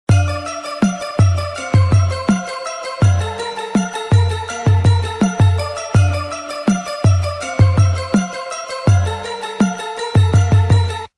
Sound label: black beat, hip hop